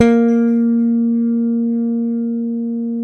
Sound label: SPC SUS F A#3, Bass; Sampleheads; Will Lee Bass Library